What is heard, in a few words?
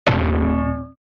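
human falling sounds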